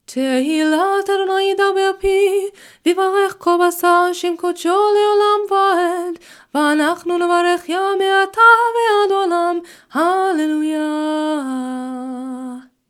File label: Ashrei closing minor nusach; weekday minchah